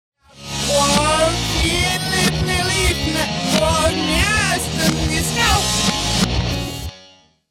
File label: reverse, heavy metal; backmasking contrario